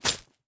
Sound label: Stab; Fantasy Sounds Bundle; Medieval Combat Sounds